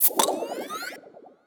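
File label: ui progress bar liquid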